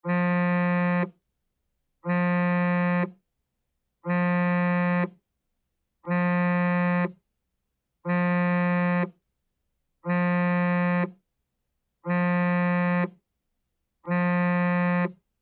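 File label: smartphone vibe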